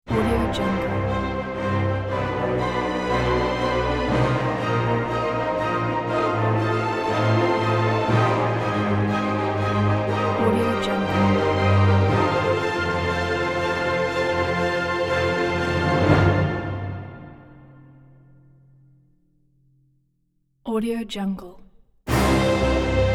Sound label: movie theme logo